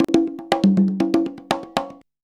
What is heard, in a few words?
CONGA 119